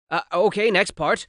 cough; battleblock theater; narrator voices; puppetshow BOSS

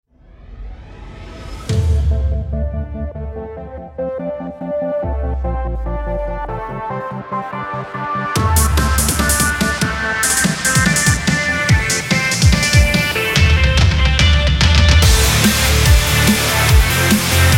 Carnival Dance Rock, melody; Ultimate Zombie Defense; Windows

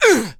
Monster Human type Death; Inotia 3

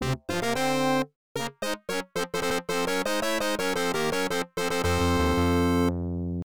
Battle Won; Operation Europe; Genesis

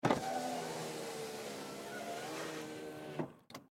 Window Roll Down

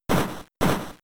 Comet Punch 2 hits

